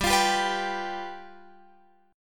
G 7th G down Guitar Open F; G7 strummed

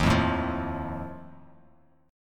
Eb Suspended 2nd Flat 5th, Bass Standard; strummed; chord; 11,8,7,10; JGuitar

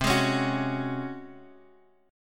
C sharp Major 7th Suspended 4th C

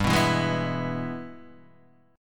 Ab Minor Major 7th Sharp 5th chord

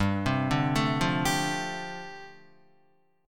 G 7th Suspended 2nd Suspended 4th G, Guitar; arpeggiated; 4F,4A,5C,5G,5C,6E; 2,3,2,2,5,3

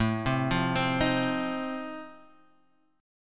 F 7th A

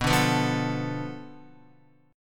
B-Minor 7th Flat 5th B down Guitar; Bm7b5 strummed

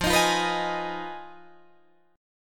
F Minor 7th Flat 5th F down Guitar Drop D

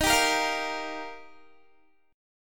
Eb Major Add 9th, Guitar; Ebadd9 strummed

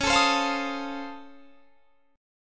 Db Augmented Major 9th, down; Db+M9; strummed

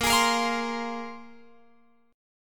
Bb 7th Suspended 2nd Bb, Guitar Open F; Bb7sus2 strummed; x,17,20,17,20,19

